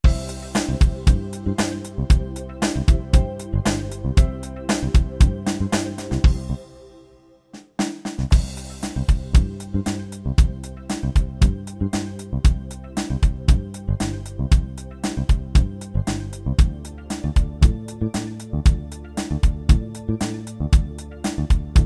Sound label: 8 Beat Analog, rhythms; mzx500; preview pack 01